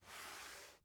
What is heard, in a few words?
Household Book Hard Cover Book Slide Desk